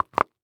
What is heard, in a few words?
Foley Footstep High Heels Concrete Single Step Down; Foley Footsteps